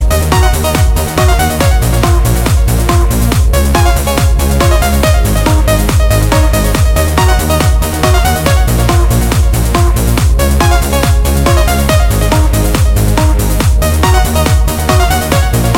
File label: Disco Mix